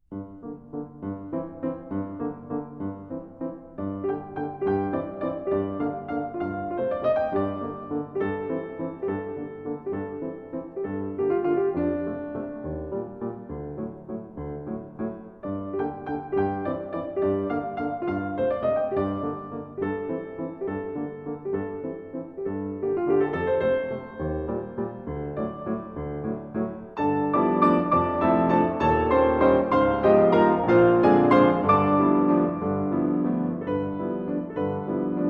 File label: Siberian waltz